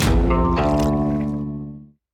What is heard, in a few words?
symbol whisky; Wild Guns